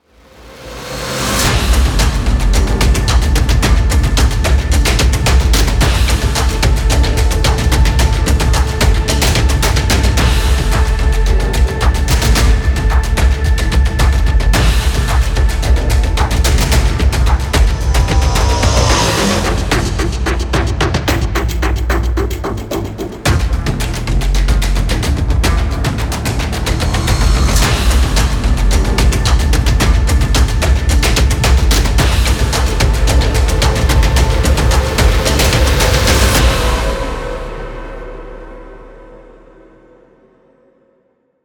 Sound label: 110B